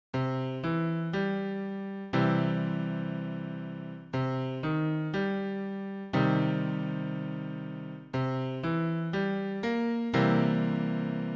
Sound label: chord C